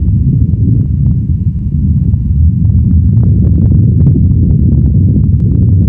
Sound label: rock thunder